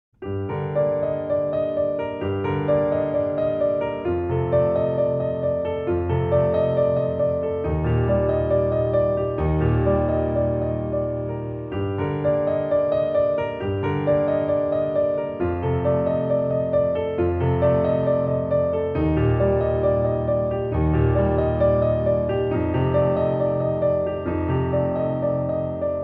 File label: Piano Solo